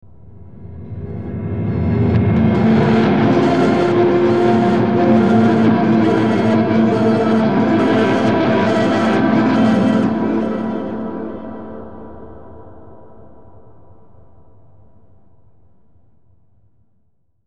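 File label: Musical Element, Drum Snare March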